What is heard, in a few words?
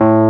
Wurlitzer Med A2